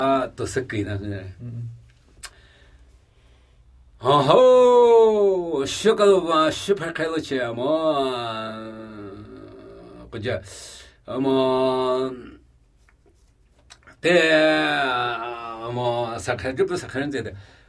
Namuyi Tibetan Ritual Chanting; Drumi mu dzo tso su

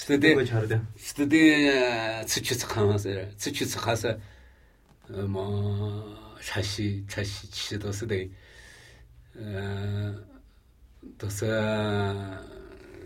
Namuyi Tibetan Ritual Chanting

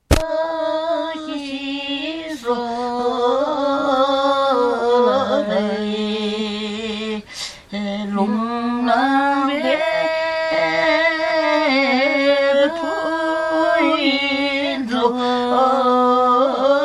Tha Rgyas Folk Song

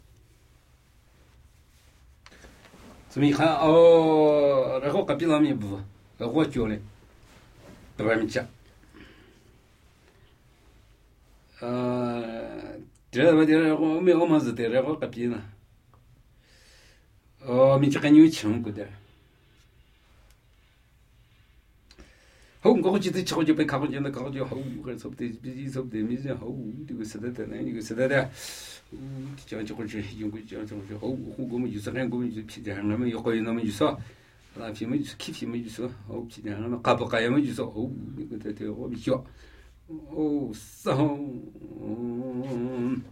Namuyi Tibetan Ritual Chanting; Phi tca pi su

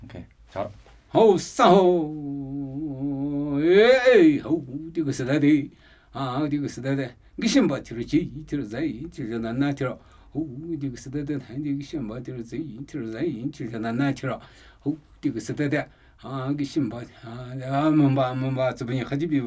Namuyi Tibetan Ritual Chanting; Mbo kho bu su

